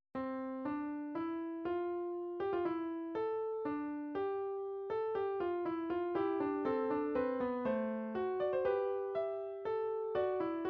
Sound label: classical fugue, midi